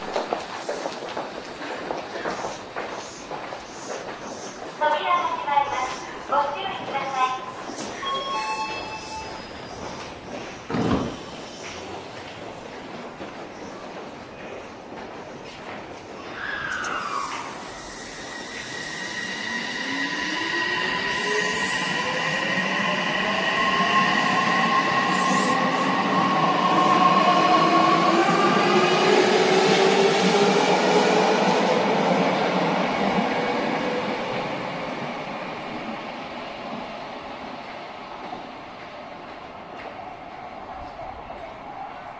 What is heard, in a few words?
vvvf, mitsubishi